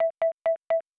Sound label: time up effect